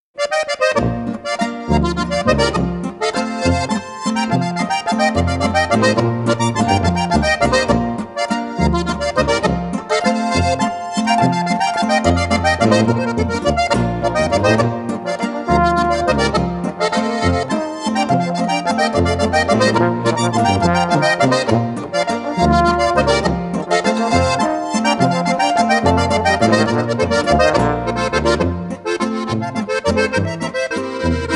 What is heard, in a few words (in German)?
Harmonika